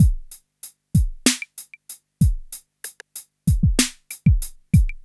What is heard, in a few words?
Smooth, R&B Drums; Sonic Foundry (Sony Creative Software)